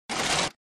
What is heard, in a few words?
Menu Up